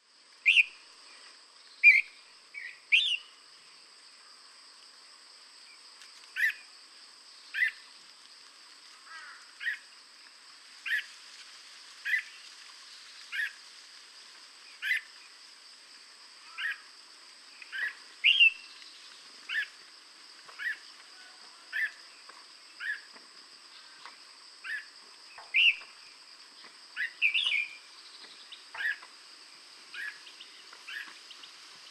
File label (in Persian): sedaye bolbol